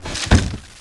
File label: Dendroid Guard Hurt Sound; Heroes3